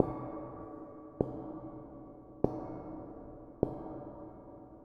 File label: steel sheet, drum loops